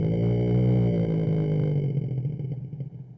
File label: Growning